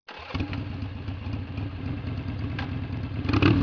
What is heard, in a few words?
starting engine